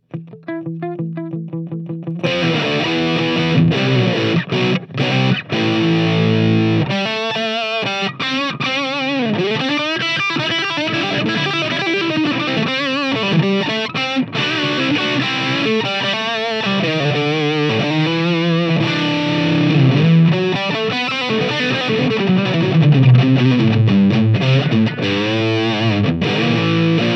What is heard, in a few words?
O D Gain